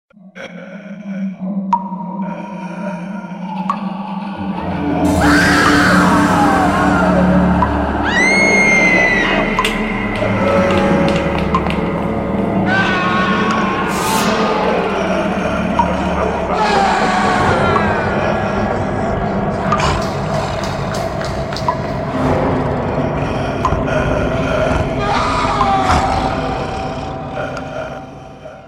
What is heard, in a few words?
funny horror ringtone